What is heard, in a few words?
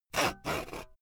saw use long slow